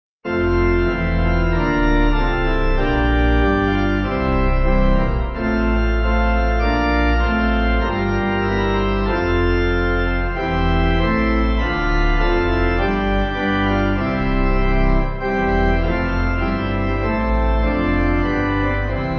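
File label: Pipe, hymn backing; Lord Of Light; Rustington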